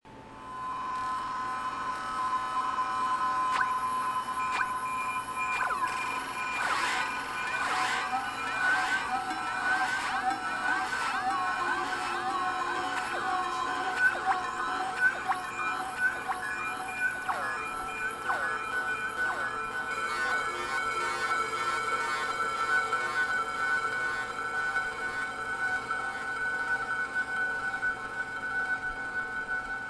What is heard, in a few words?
Waldorf Largo synth tweaking cutoff filter